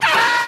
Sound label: scream